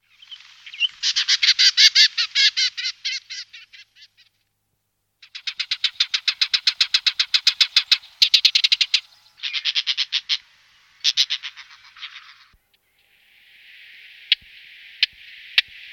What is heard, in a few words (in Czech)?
pěnice mistrovská